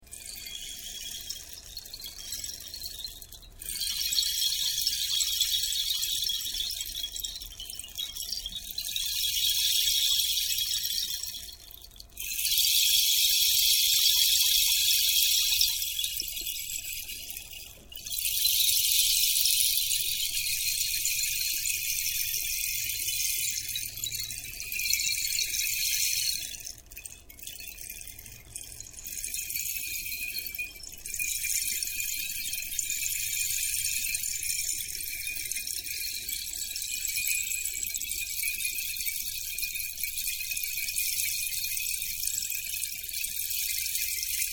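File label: bamboo, rain stick; posoh dojdya; 1m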